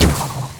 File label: projectile launch; Dota 2; Drow Ranger